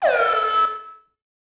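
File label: outdoor alarm loop; Star Wars Obi-Wan; XBOX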